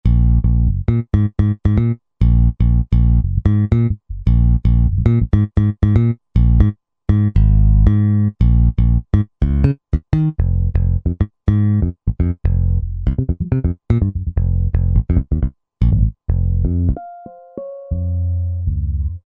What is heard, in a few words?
Solid Fng, Bass & Drums; Roland SR-JV80-10 Bass & Drums